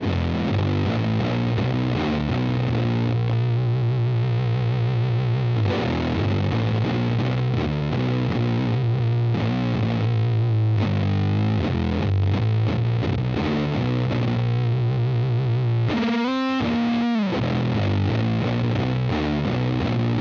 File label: Sat, guitares